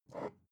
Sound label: glass bottle pick up soft